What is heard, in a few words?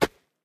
DIRT, steps; Terrarum